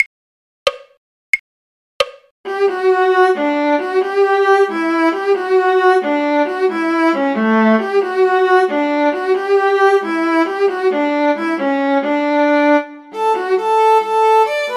viola; folk

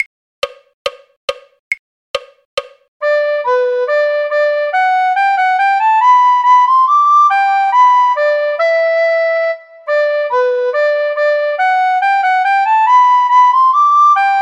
recorder; Soldier Soldier Won't You Marry Me; folk

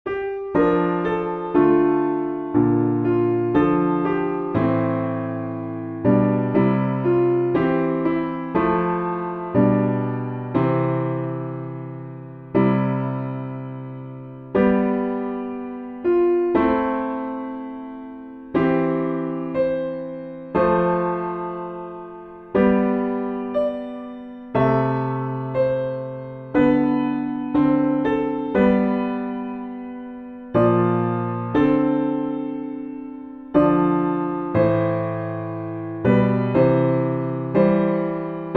my song is love unknown PIANO BACKING; recorder; hymns

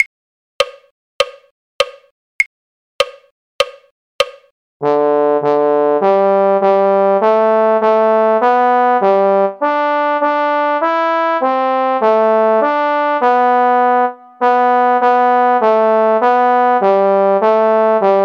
trombone; carols

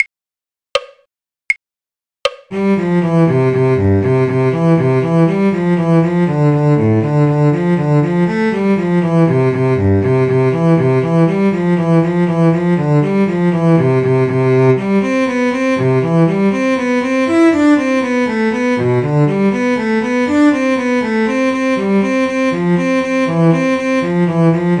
cello; the irish washerwoman; folk

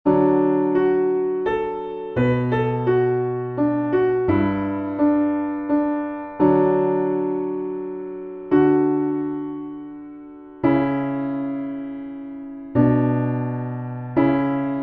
piano backing; hymns; Be Thou My Vision

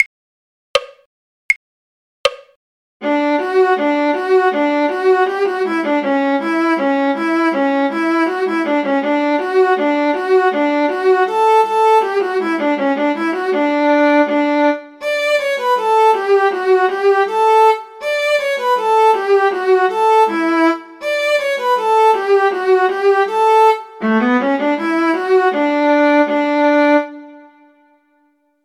viola; the galway piper; folk

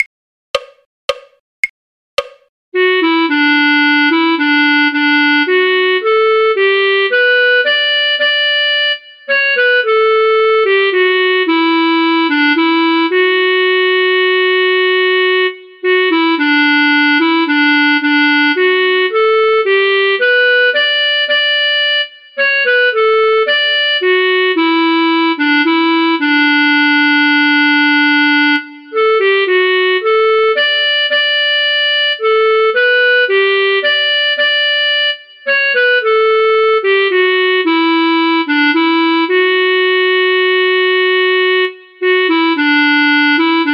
clarinet; believe me if all those endearing young charms; folk

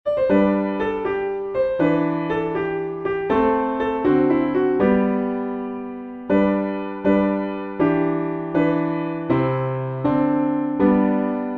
piano backing; tuba; The Seven Joys of Mary

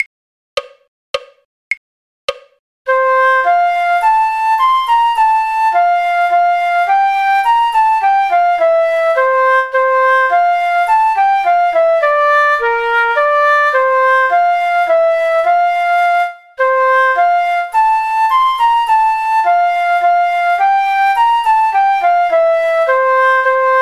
flute; the ash grove; folk

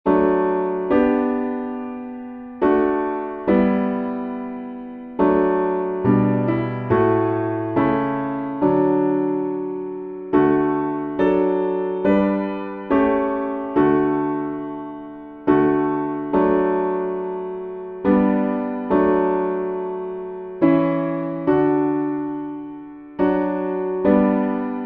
piano backing; hymns; recorder piano hymns

